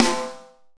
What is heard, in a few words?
Shiny Snare Sound C Key, steel snare drum sound tuned to the C note